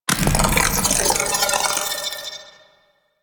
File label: Reanimate; Warframe; The New War; Sentients